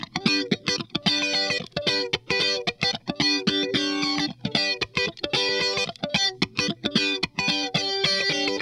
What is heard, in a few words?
SQUASH, Funk Guitar; 90 s Sample CDs; Zero G